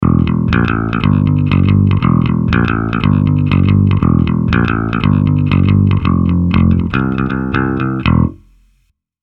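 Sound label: Fame Baphomet Gothic, guitar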